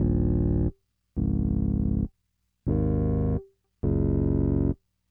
BSE, Hip Hop and R&B Drumloops; Best Service ProSamples vol.01; AKAI

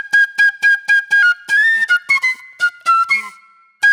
Club Flute

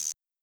Guiro, Roland TR-77; Drums

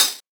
Tamborine, 707; classic machines; 707mod

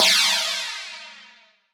Cym Flng Pang; Alesis D4

